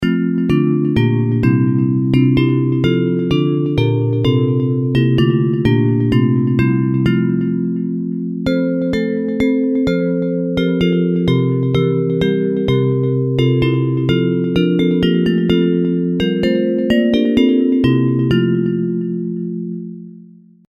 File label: Bells, Traditional hymn